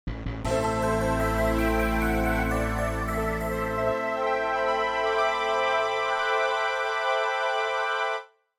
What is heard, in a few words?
Midi Gameover; Shining Plume 2 Windows gamerip 2017